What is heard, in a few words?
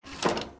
door close; doors